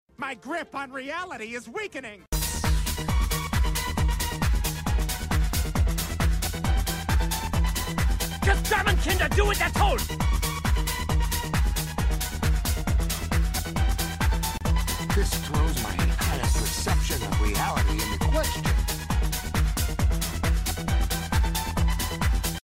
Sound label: Techno